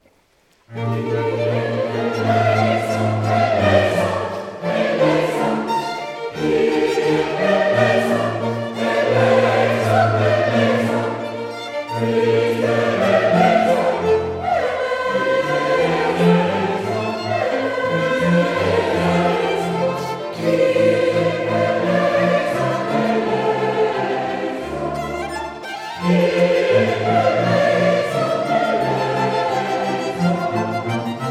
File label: LITANIAE LAURETANAE Kyrie; CONCERT 2013 PLESSIS PATE; Hasse